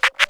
Vinyl; Super Holobunnies Pause Caf

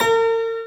Beat

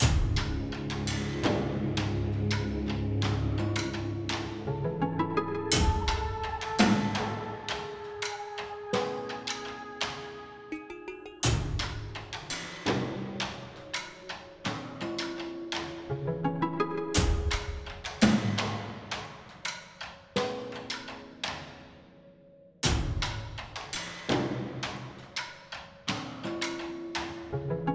music dungeon dice tray

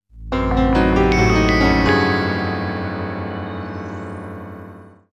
Lose - Time Out

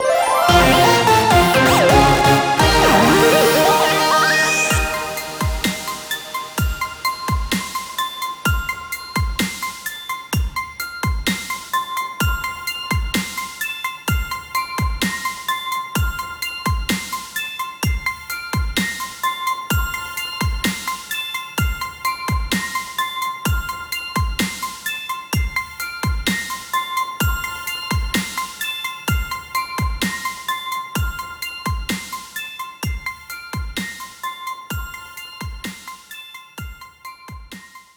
Jingle - World Clear; super monkey ball banana mania original soundtrack

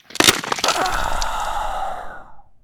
Die; 1916 Der Unbekannte Krieg

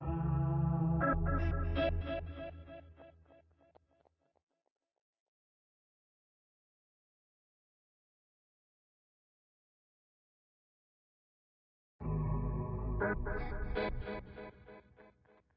Guitar Fx

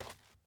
dirt step

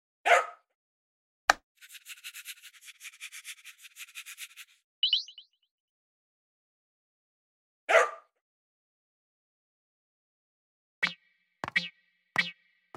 Stage Clear

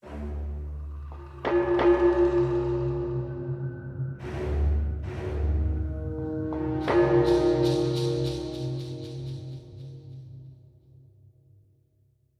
Jingle; SOCOM U.S. Navy SEALs Fireteam Bravo 2; PSP

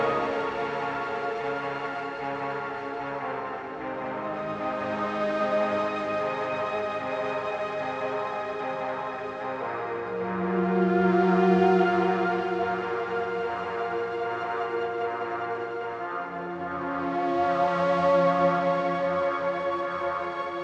Loop No drums 150bpm; jenny leclue detectiv

